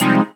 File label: Synth Sound; Vengeance Samples; VEE Synth Shots